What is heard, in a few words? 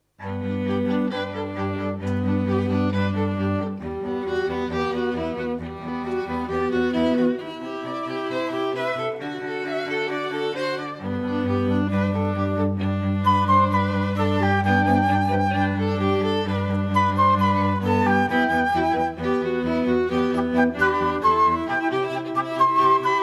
Quartet